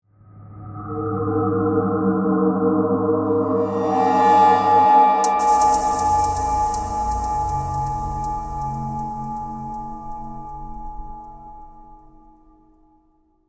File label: Composition Eerie, Horror, Composition, Eerie, Haunting, Reveal; Scary Soundscape; BlastWave